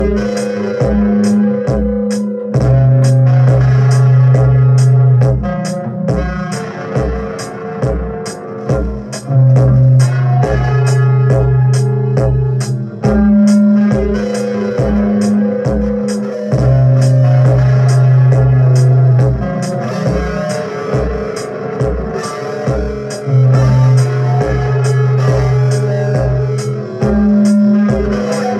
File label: SUDDEN DUB (WITH DRUMS)